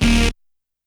Hard Stab H; YAMAHA RM1X

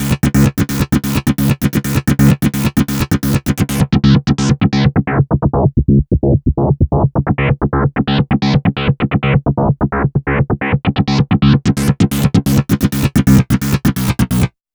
Bass, EDM; Sonic Foundry (Sony Creative Software); Afterhours EDM; Ibiza Underground

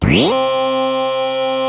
DRONE, SF EFFECTS; Q Up Arts; Dream Experience

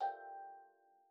G4, STEELDRUMS; Xsample VOL-4

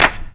CP, CLAPS, House Side Of 2 Step; USB Soundscan vol.51; AKAI

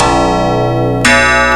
Yamaha DX5 BELL SYNTH; OTHER SYNTHS; YAMAHA FM; Rubber Chicken Software Sample Bank

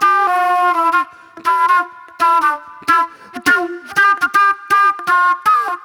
Classic Soul Flute; Sonic Foundry (Sony Creative Software); Manhattan Flutes; Soul 1

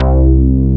Roland Synth Bass, Analog Bass; Roland - Rhythm Section

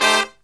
LOW CHORD, Killer Brass Riffs

